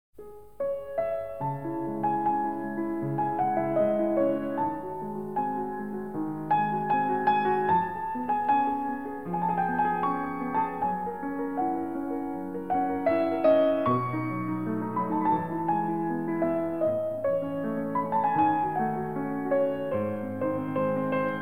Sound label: instrumental